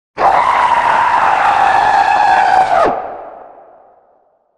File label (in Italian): urlo terrificante suoni horror; suoni horror sounds